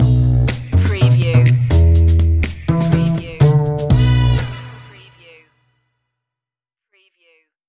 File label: tele fusion stinger